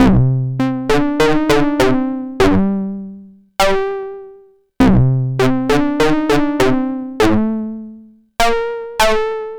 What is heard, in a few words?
Synth Pattern; Electrocution